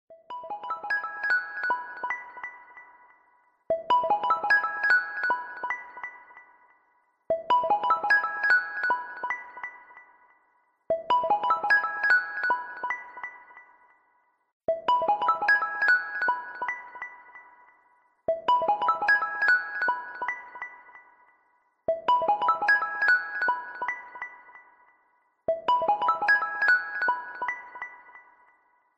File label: very soft background music tone ringtone